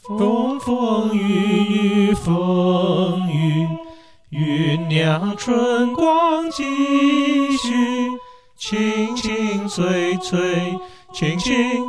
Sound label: Soprano Voice